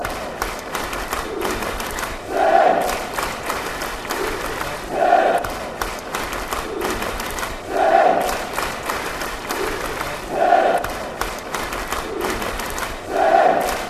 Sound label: clap, chants; bradford